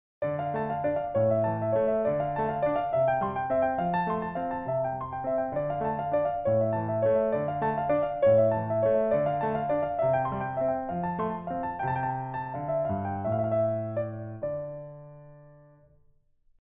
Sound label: folies d'espagne piano; SachaDV